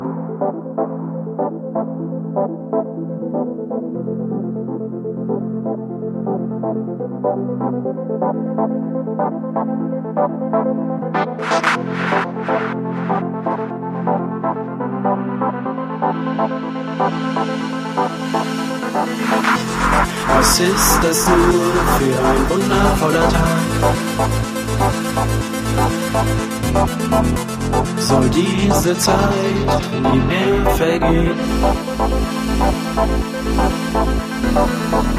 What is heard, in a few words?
synth remix